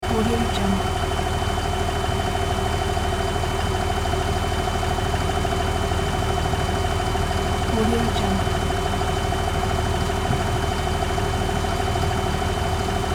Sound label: car engine